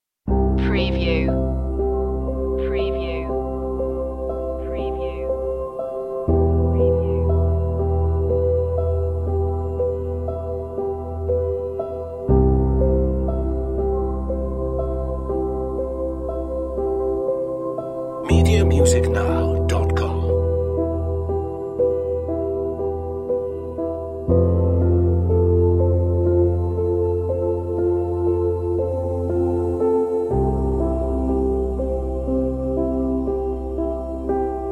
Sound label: flowing emotions no drums